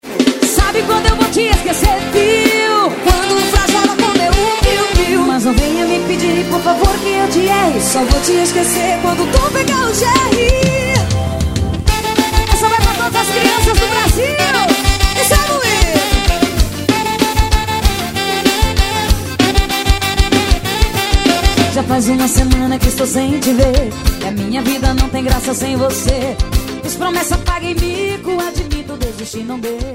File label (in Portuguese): forro